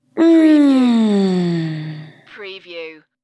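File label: Female Relax Sigh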